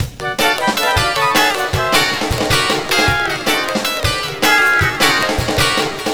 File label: Flutes & Swingers Full Mad; Zero-G Kocktail Kollection; Construction Kitz (Lounge); Flutes & Swingers 156